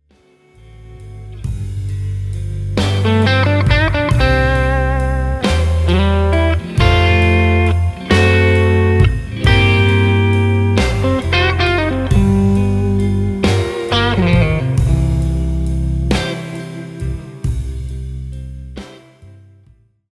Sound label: blues turnaround solos